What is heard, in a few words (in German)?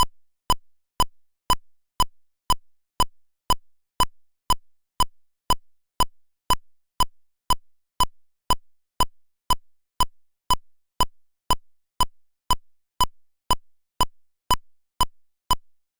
klick t120 loop